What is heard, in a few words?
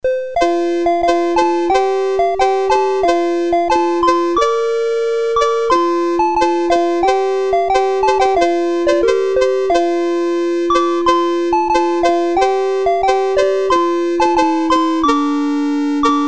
BASIC, CSG8580R5; Happy New Year